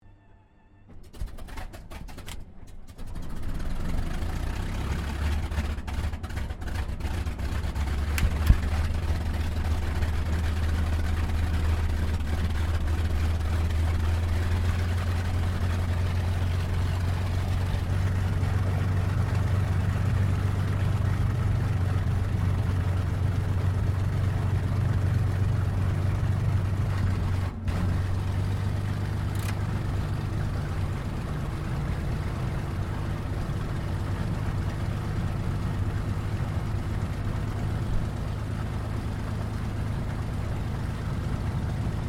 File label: AIRCRAFT CAC CA-18 MUSTANG MK23 STARTUP IDLE INTERIOR; CA-18 /P-51 Mustang; Airplanes (Sound Effects:Vehicles)